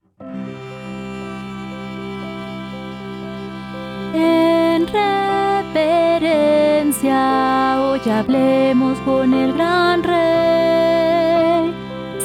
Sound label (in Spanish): En reverencia, Cantos; primarios 2026